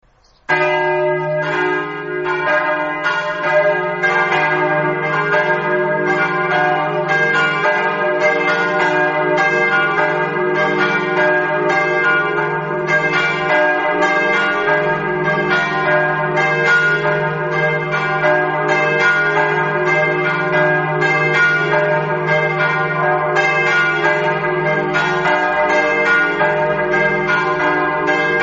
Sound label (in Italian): campane